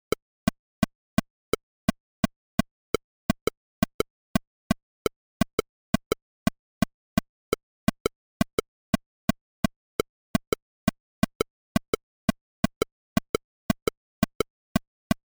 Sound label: Parody Click B